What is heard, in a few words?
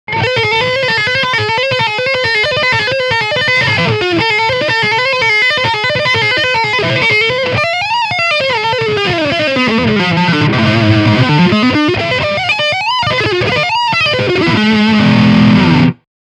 Lead Channel Lick